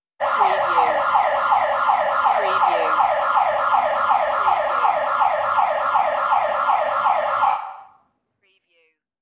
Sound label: alarm siren